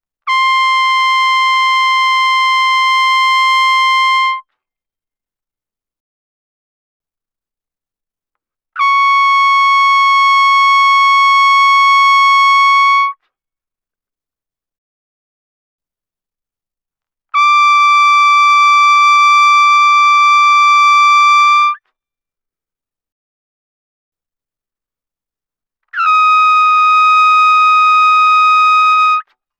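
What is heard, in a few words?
Trumpet no vibrato ff C6 Eb6; Brass; Bbtrumpet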